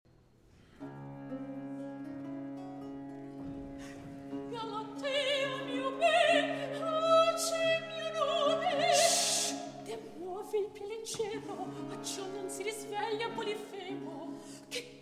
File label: Recitativo; Galatea, Mio Bene!; Polifemo